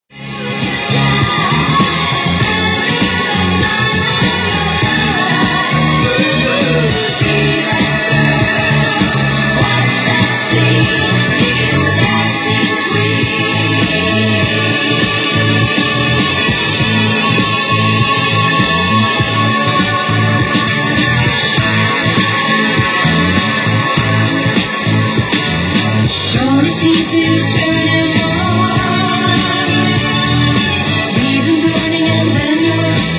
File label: House Music